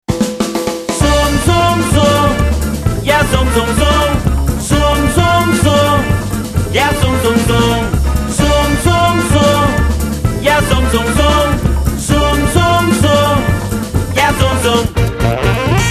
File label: punk jazz